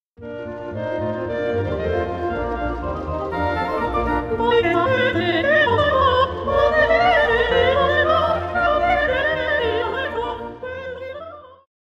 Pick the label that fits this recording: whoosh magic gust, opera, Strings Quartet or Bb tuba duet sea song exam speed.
opera